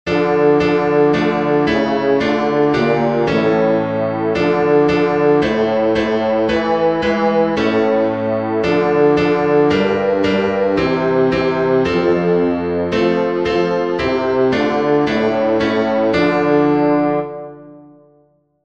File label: heinlein bass